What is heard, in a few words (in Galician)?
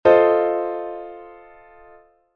acorde cuatriada